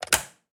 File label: Electro Switch; MiSide